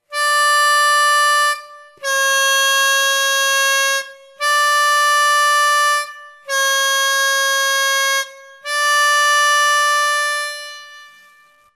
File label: bend, harmonica